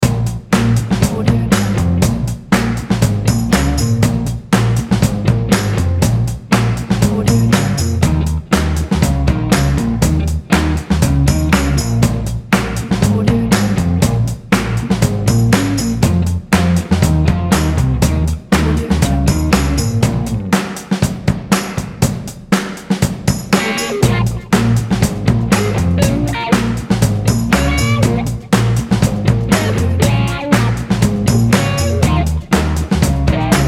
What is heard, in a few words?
motown groove